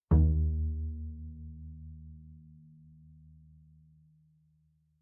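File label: Bass pizzicato fortissimo sul A Eb2, Double Bass; MIS Pitches - 2014; Strings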